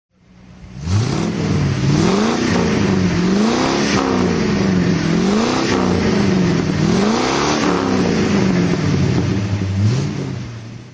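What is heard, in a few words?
facel vega excellence throttle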